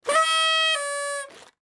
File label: party horn; twitch